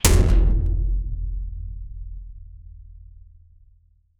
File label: heavy metal ball hitting, deep impact